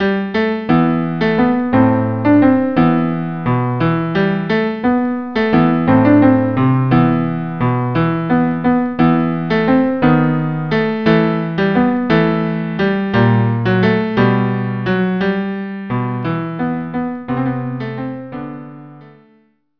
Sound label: Canzonetta Tarantella Cello duo; Rimsky